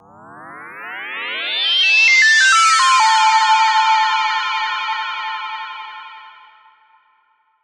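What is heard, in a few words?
fx rising synth A minor; synthbot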